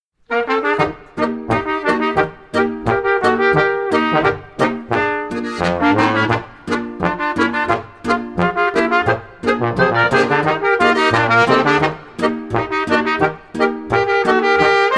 Jubiläumsboarischer

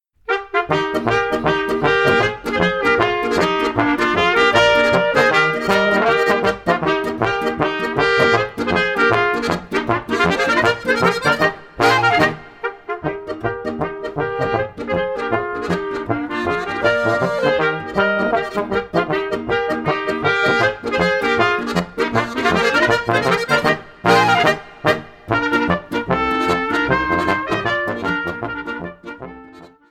Klocka Polka

